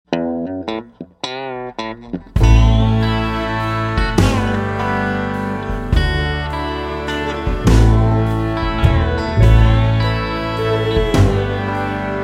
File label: Waltz; Bakersfield